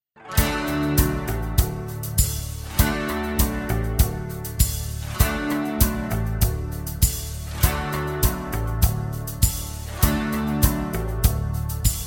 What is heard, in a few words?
D No Backing Vocals, backing tracks